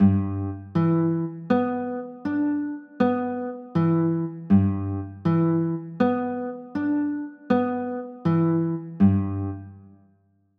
g 13, chords; g 6

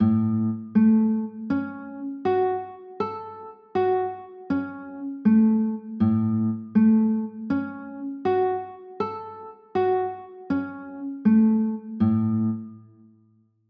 a 6, chords

